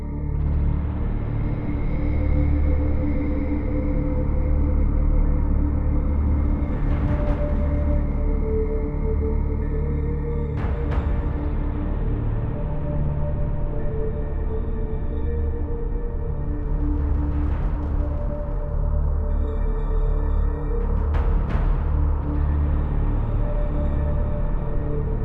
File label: Quest Bloodguard Combat; Eon Altar